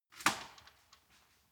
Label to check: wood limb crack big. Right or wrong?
Right